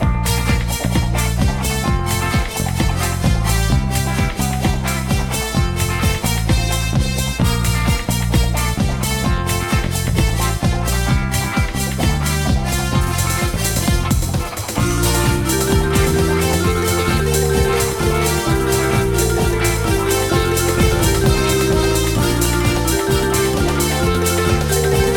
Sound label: Brass Disco; Loadout soundtrack